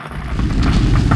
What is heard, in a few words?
RMBL STRT